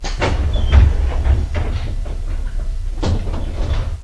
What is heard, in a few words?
Door TK-4D Close